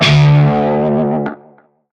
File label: Shawzin Flourish Oct One D; Music; Warframe; Narmer Shawzin